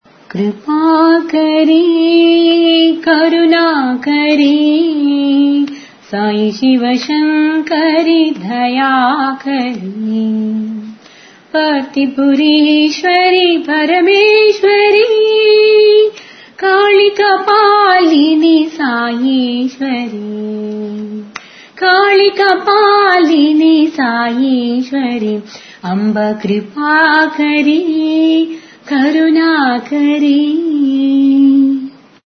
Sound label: Bhajan; Kripakari